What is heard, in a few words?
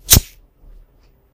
Lighter